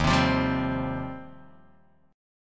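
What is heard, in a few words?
E flat 6th Add 9th E flat, down, Guitar, DADGAD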